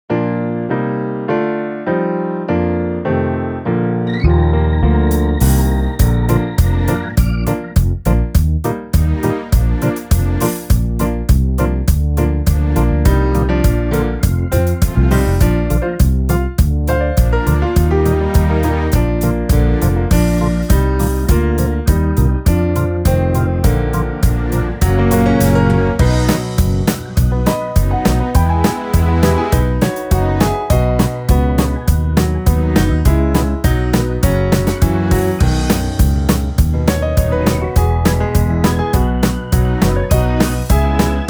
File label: God Is Love Instrumental